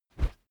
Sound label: whoosh wood cornered soft; swooshes-swishes